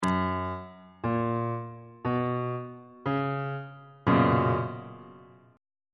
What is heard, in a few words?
b major 7th suspended 2nd 2nd inversion on bass clef